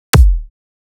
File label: dubstep